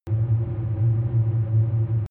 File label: drone hollow wind low